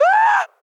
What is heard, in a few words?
Haaaaaaaaaah Excited; EDDY; Ed Edd n Eddy; Adromulus Pack; EEnE